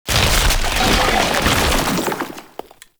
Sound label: tank infested charge recover; Enemies; Abilities; Warframe; Lasrian Tank